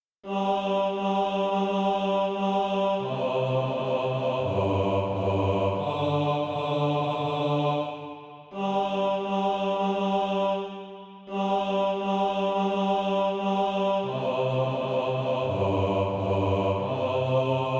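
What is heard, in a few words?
bass, Sheet music (SATB) including Practise Music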